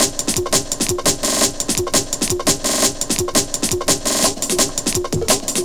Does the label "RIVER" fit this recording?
No